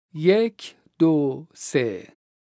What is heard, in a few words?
vocalization, Daf